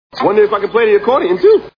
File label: accordeon; demolition man